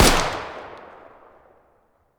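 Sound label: fire distant 300blk rifle exterior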